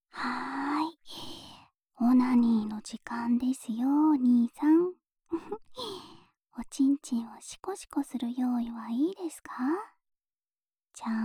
耳舐めループ（右耳）, ASMR; オナサポ用耳舐めループ（右耳）

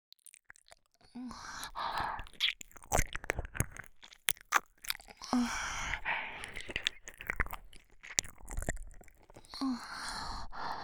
耳舐め (左耳); 溢れて止まらない愛欲

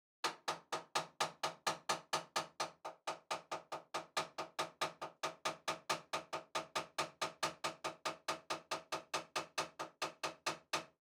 切る 虚空 高速; 3DIO; 食事に関する音

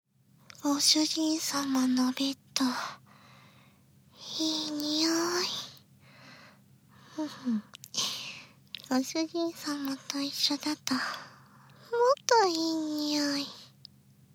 囁き吐息

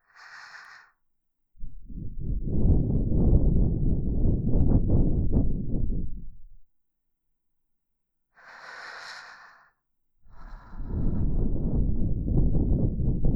耳ふぅ（左耳）; 世話好きOL♪癒しの残業耳かき

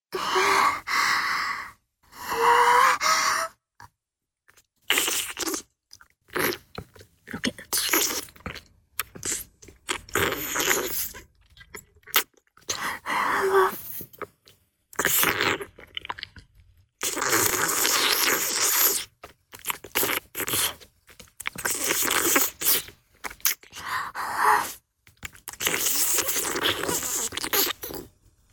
フェラチオ(弱):包皮の被った亀頭を舐める, チュパ音; チュパ音抜粋集

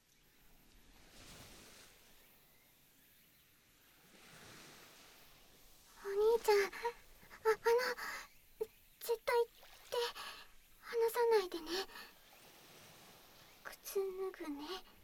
海でデート・耳舐め, ASMR

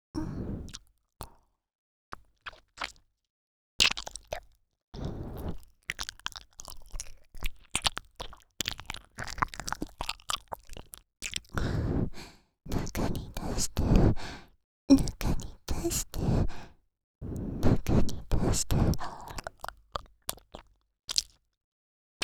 耳舐め; 中に出して♪; 静華

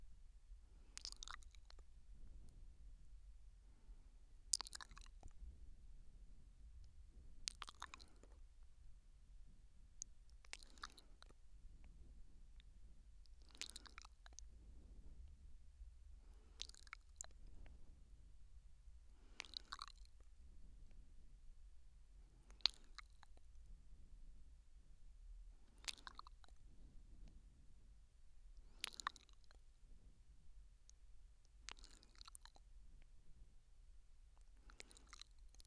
右耳甘噛み

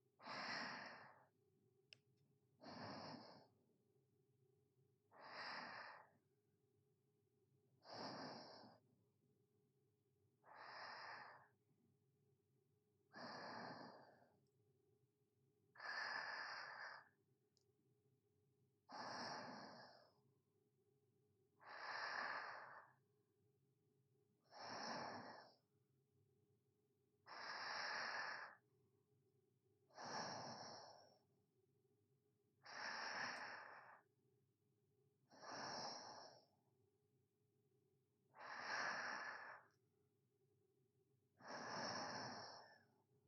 添い寝（寝息）, ASMR

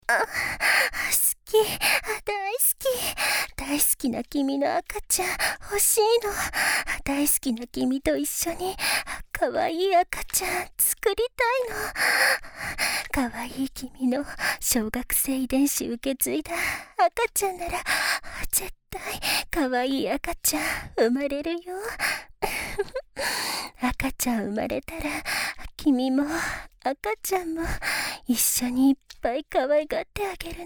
囁き耳舐め; 童貞卒業セックス; ドスケベ先生; ドスケベ先生とはじめてえっち！

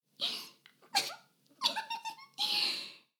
しこしこ音声; 牧場戦腺; 少佐殿

